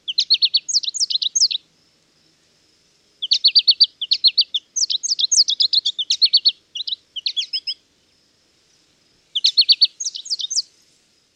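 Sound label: American Goldfinch song; bird language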